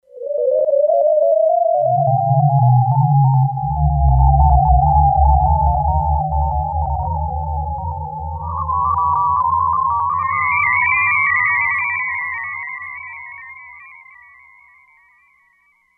E-mu Proteus-2; Factory Presets; Darn Saucers

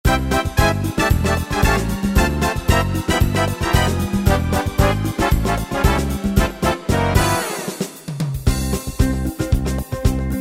Samba, Music Finder Styles; Yamaha PSR-3000; Quando Samba

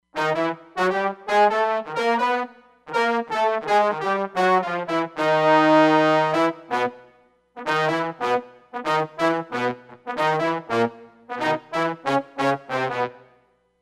Tb Section, Roland Fantom XR

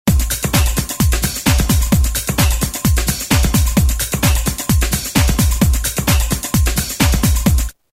Hip House 130; Roland M-DC1

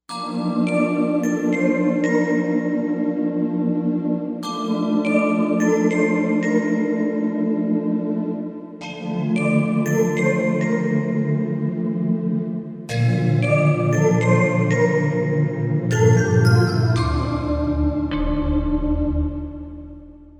Metal Voice, Korg M1Rex; Factory Patches

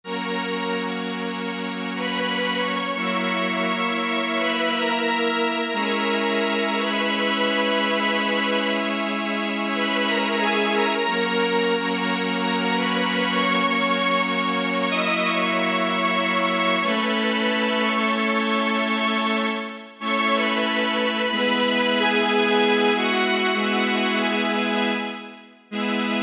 Elka Rhapsody; synthesizer; Guess the synthesizer game

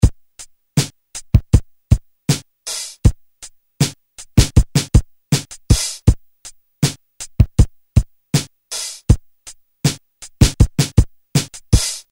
Fonky, Korg DDM-110

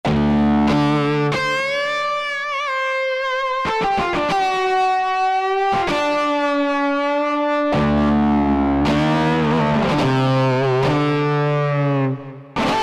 Lead Guitar, Korg N364; Factory Prog demos

